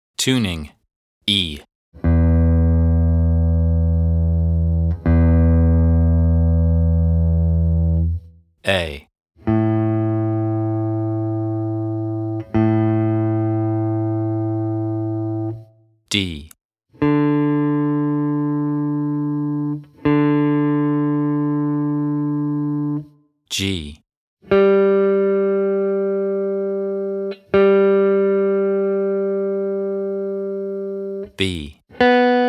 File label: Tuning